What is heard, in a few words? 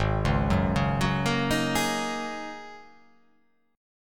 G sharp 9th Suspended 4th G sharp